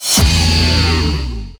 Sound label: Game Over